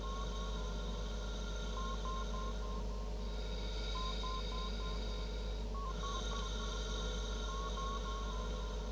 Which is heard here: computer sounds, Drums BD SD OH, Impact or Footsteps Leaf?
computer sounds